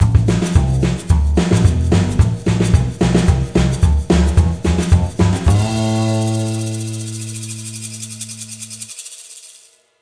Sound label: Music Stings; Entrance mood 2